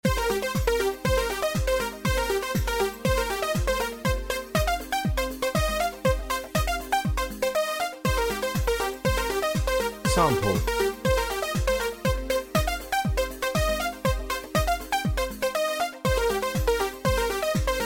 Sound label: beat